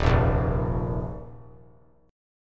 Eb Suspended 2nd; strummed; chord; 3,0,1,1; JGuitar

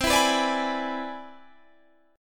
C Augmented Major 9th, Guitar, Half Step Down

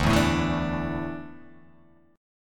D Suspended 2nd D down

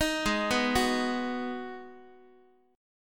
Ab Minor 7th Ab, Guitar; arpeggiated; JGuitar

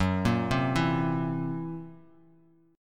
Gb 7th Flat 5th, chord, Bass Standard; arpeggiated; JGuitar

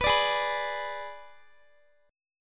G sharp Diminished G sharp, Guitar; G#dim Chord

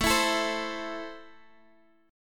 A Minor, Guitar, Open F; strummed; chordshape

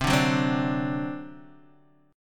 C Suspended 2nd Flat 5th C down Guitar Open D

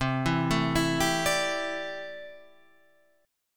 C 6th Add 9th C down Guitar Standard; arpeggiated; JGuitar

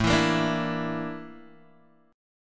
Bb Major 7th Flat 5th A

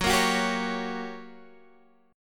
G Minor Major 7th Flat 5th, Gb

